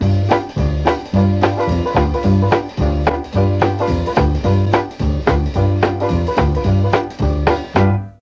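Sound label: B2 D BONE, mellotron trombone; DIXIE+TRMBN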